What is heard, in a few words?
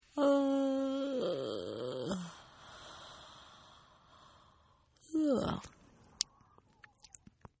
Yawn Female; Yawning; Partners In Rhyme